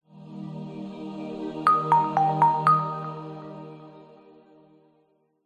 multimedia game sound musical intro warm pad wooden mallet melody